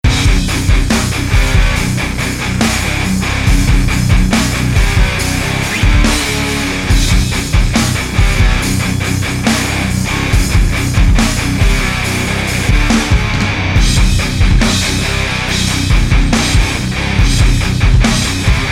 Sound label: JFET Series Rectifier